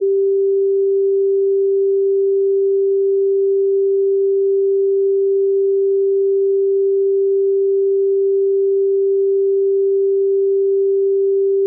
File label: 392Hz